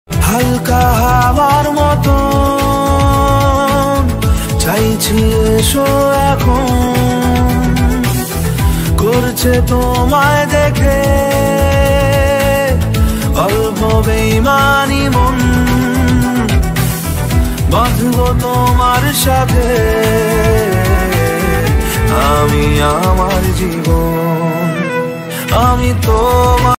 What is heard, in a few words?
bengali ringtone